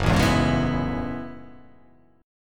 Gb Minor 11th Gb down; Gbm11; strummed; JGuitar

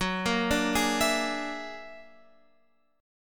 F sharp Augmented 9th F sharp, chord

